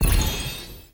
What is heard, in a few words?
ui light